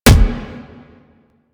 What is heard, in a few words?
Explosion Punch Generic Small; Warframe; Weapons